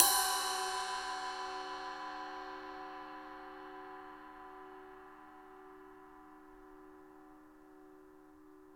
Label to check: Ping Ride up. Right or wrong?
Right